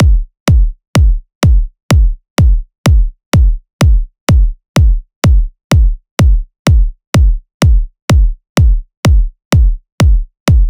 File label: kick after